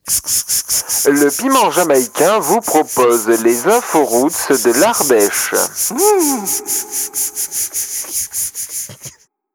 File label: jingle